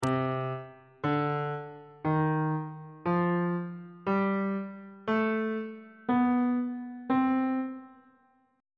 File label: c melodic minor key signature on bass clef